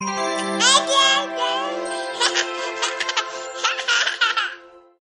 kinderlachen cackle mid baby cackle

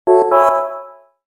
ipod sms toene iphone style

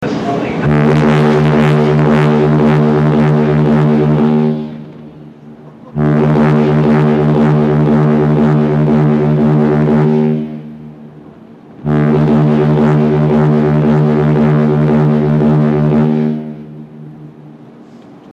schiffshoerner southampton

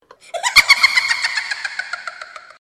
hexe fieses boeses lachen

